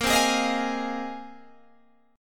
Bb 7th Suspended 2nd Suspended 4th Bb down Guitar Open G